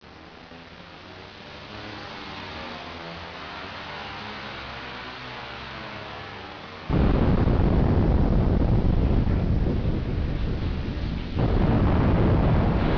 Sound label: BLAST